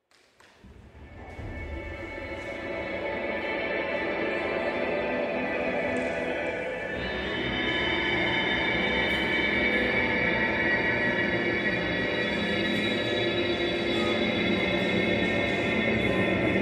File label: Light Rain Laganside; Eric Lyon